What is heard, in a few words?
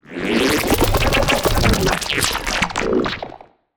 Sci-fi Malfunction Rumble